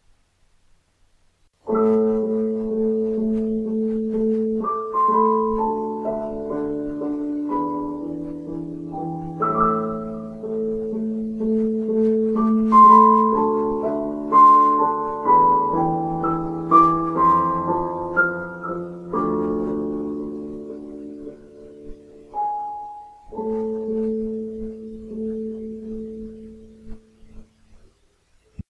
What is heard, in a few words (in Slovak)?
plachy akord